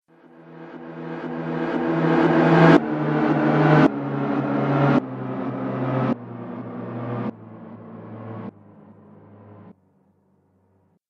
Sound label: Haunting Horror Element, Ghost; Ghost Sound Effects